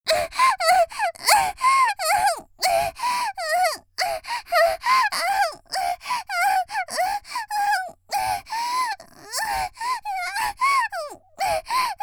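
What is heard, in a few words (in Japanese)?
（少女）「喘ぎ」無理矢理されて嫌がる, 元気な少女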